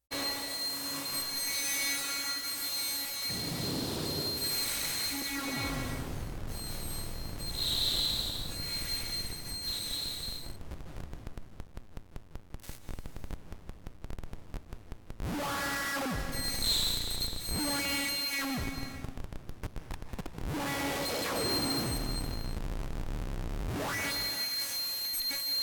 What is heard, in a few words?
bat machine, noise